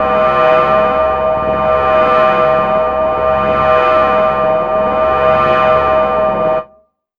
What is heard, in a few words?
syn, Chillout Synth Atmos